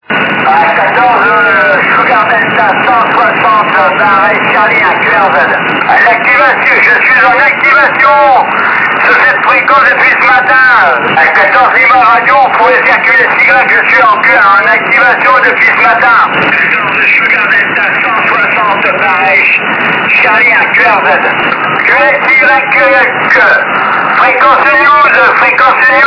27.680 MHz usb

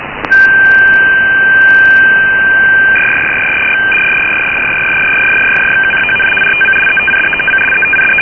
6697 RATT aircraft

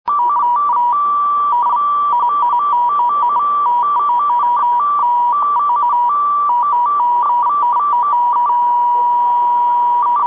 5mhz strange signal

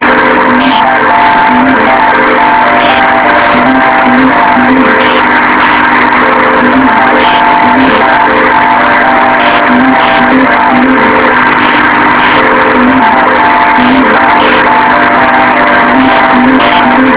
480 kHz

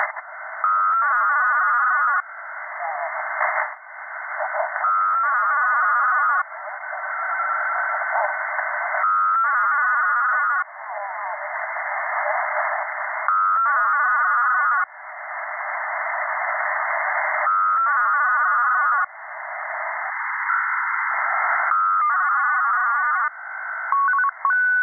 7051 lsb

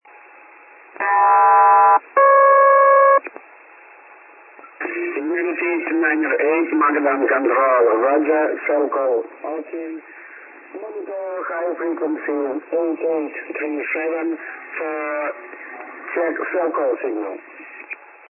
magadan radio

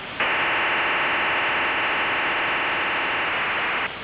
psk-2 2400 bd

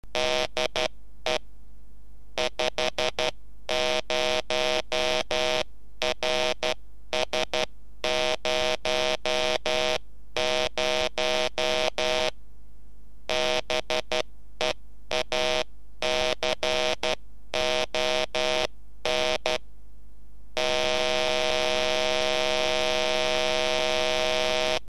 beacon

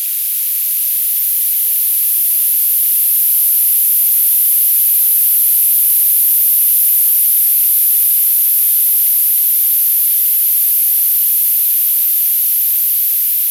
16310khz rf; hdsdr

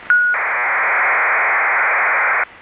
HFDL 300 bps

